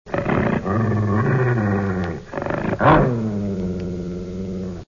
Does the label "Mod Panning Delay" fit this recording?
No